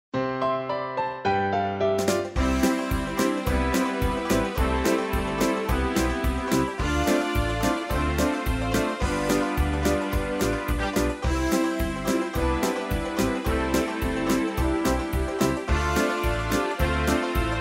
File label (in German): nur Begleitung